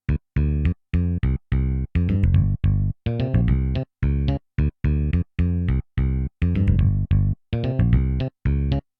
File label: Bass synth Dolby-ish, XV-88